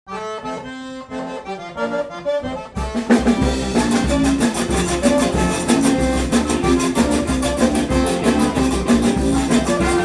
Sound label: Creole Stomp